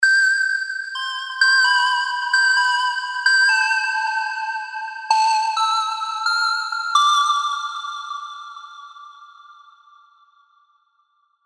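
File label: lead, Tape, spire; Bro; MYON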